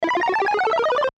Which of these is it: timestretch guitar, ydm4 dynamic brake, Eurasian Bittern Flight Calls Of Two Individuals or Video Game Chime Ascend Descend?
Video Game Chime Ascend Descend